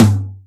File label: 202 tom, Drums, Boss DR-202